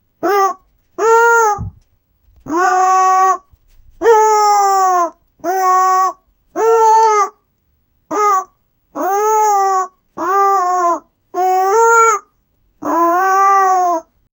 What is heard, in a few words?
the rabbit call for help